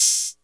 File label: Closed Hihats; Cymbals; Vengeance Samples